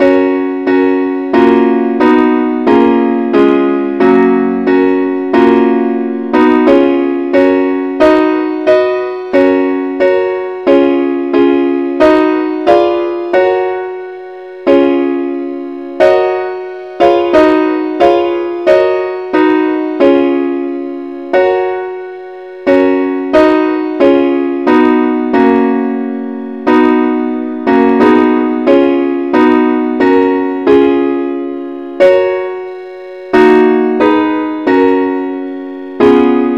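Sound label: advance accompaniment key g flat; christe Sanctorum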